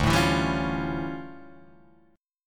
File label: D-Minor Major 11th, D, Guitar, Drop D